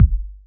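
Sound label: kick, Boss DR-202; Drums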